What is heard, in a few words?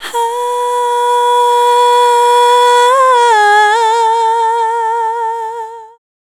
Dance Vocals, FEMALE